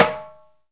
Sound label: bek, Drum Circle; Drum Samples; "Bek" sound